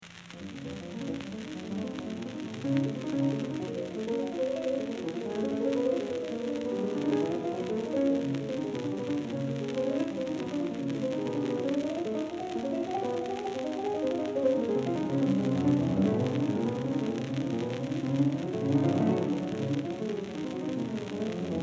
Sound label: Piano Sonata; Chopin; 2 In B Flat Minor, Op. 35, B 128, Funeral March